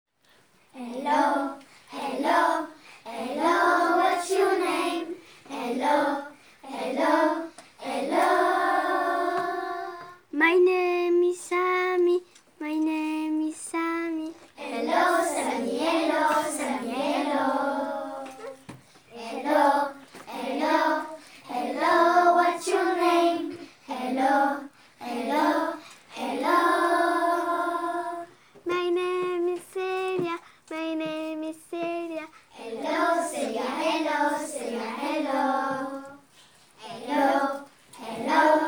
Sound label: Vocal